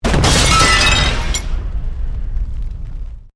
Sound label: Explosion Medium Metal Glass; Created explosion effects, house, building or vehicle effects; Bomb Sound Effects